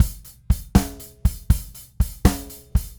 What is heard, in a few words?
Rocky Mountain Groove, Drums